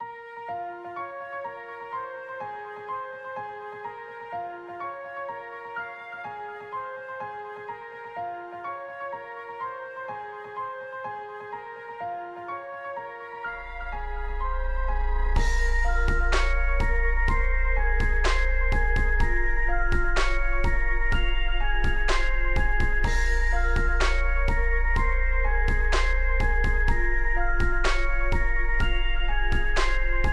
(Instrumental); Friday Night Funkin' Sunday Night Suicide Rookies Edition

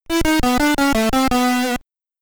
Died (SID Stereo); Castlevania; Commodore 64

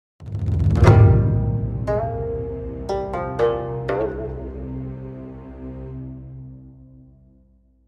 death Stinger; B Path of the Teal Lotus

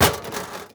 plastic break

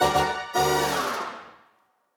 Victim Reveal

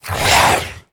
Zombie Monster Attack; Undead Collection

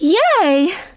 Emote Cheer; Twitch Skijump; Tia

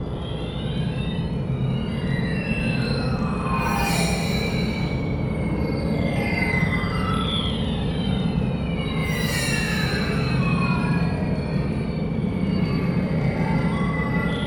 Screen Atmos; Bedlam

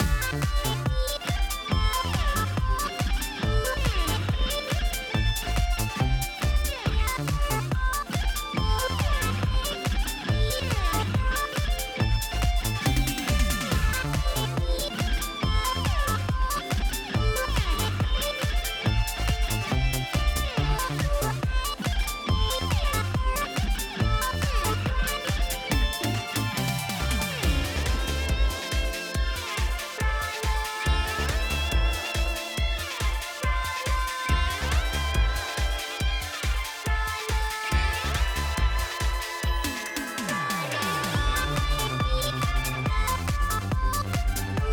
Anime Loop

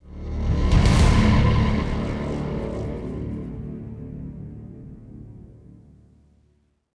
Action Clear; The Dark Knight Rises; Android, iOS

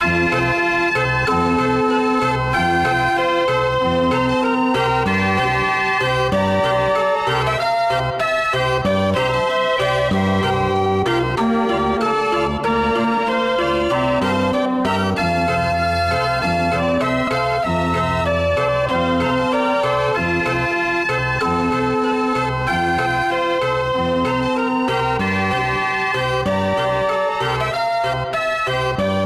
Keyboard Instrument; assassin s creed syndicate

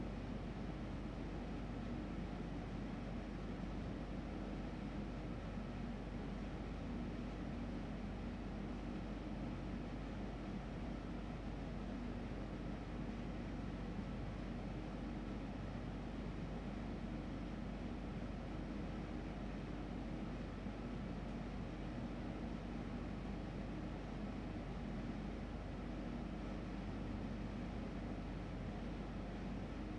indoor factory; teardown; ps5 windows xbox series xs

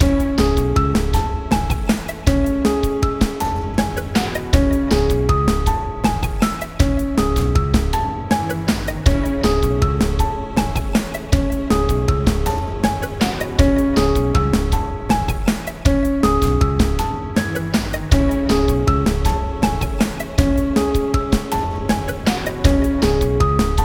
Cemetery Loop

